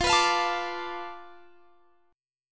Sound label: B Minor 7th Flat 5th F, guitar chord